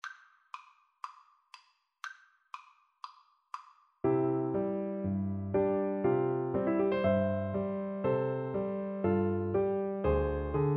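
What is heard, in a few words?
reed pipes marimba, Percussion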